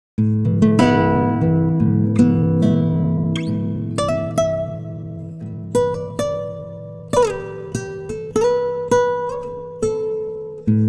timestretch guitar

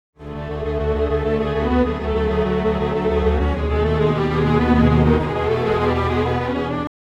strings, timestretch

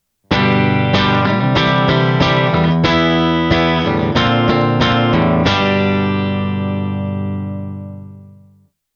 fender bassman profiler, guitar amp simulator; kemper profiling amplifier

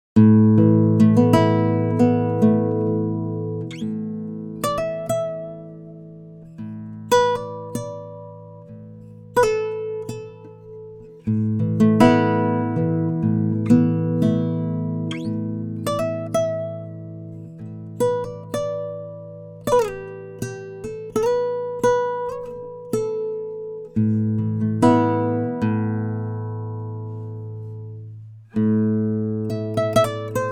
acoustik raw; tc electronic sk48

